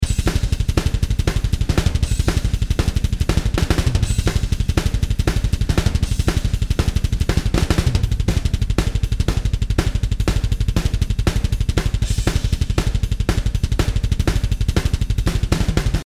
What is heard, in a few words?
Triplet Groove